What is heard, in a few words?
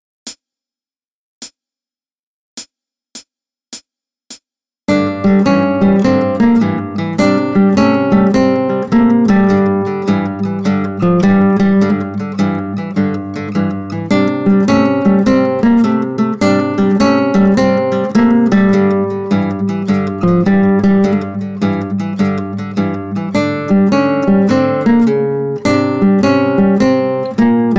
blues riff